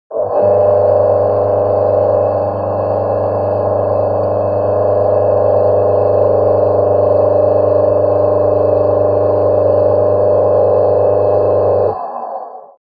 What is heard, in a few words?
Electric Motor Const